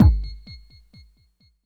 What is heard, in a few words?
END, Tekno Industrial; East West